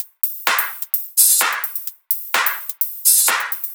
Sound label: Electro Loop 128BPM; Vengeance Samples